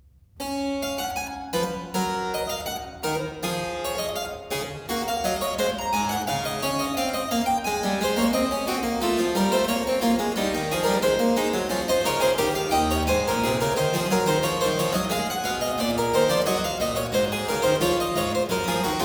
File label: Dreistimmige Inventionen in D major, Keyboard Works; BWV 789; J.S. Bach; Inventionen & Sinfonias